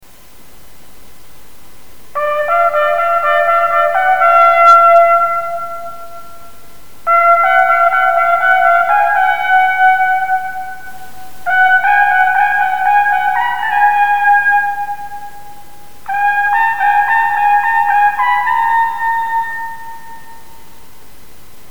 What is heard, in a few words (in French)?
trompette exercice, trompette naturelle